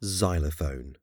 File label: xylophone